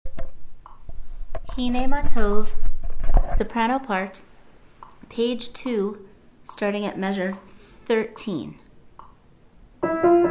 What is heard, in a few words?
Soprano Hiney